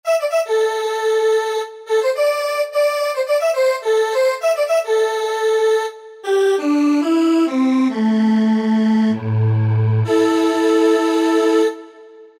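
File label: Fairlight; Rol Fairlt; Sonic Reality Modern Songwriter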